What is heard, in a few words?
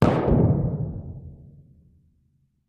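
2 Oz Black Powder Bomb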